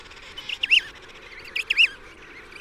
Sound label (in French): poussin empereur